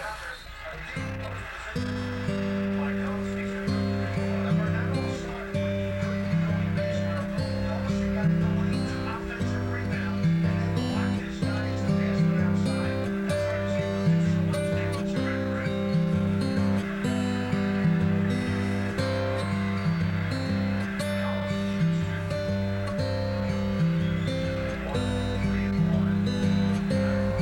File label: fingerpick